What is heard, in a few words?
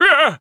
Wizard Pain